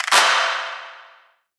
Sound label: Clap; Cymatics; Light Free Sample Pack; Drum One Shots